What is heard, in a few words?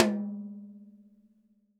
TD-20 Tom A, Roland TD-20 Toms